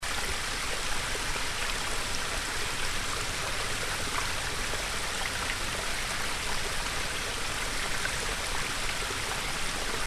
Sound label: Wadsworth Little Falls